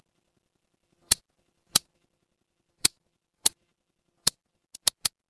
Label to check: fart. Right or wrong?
Wrong